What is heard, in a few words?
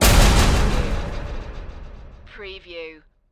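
Production Element Hit Delay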